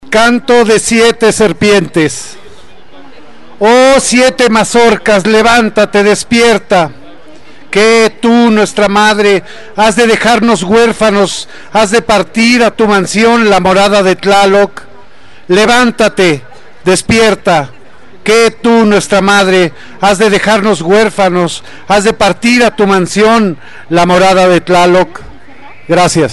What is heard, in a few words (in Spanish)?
canto serpiente en el ángel